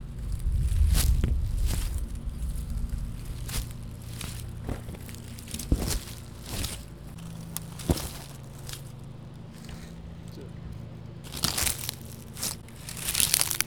leaf

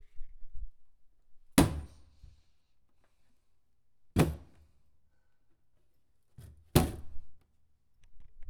Dropping Chair; West Hall

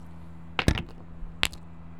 Rocks; fieldrecordings

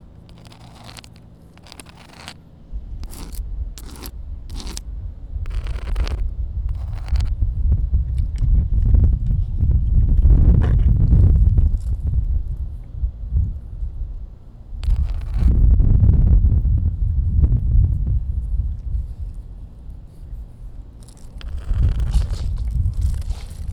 stone

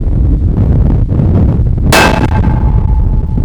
Thunk guardrail; fieldrecordings

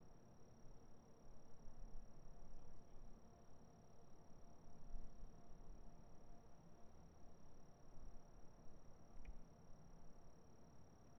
Quiet Grasshopper; fieldrecordings

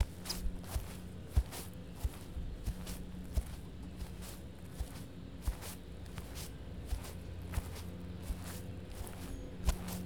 walk on ground

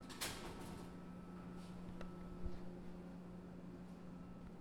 Tunnels

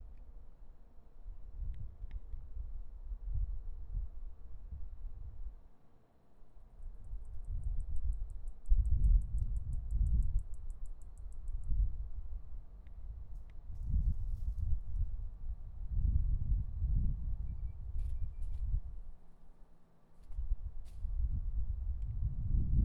Clicky Bug; fieldrecordings

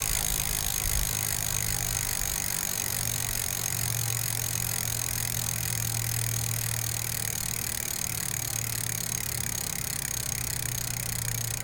Bike; fieldrecordings

